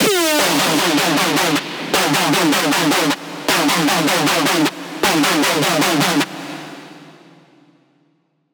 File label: screech loop D min 155; Music Loops; GhostHack